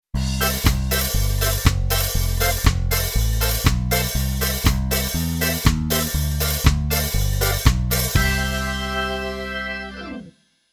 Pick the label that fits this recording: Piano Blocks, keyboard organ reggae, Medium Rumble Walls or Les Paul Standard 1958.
keyboard organ reggae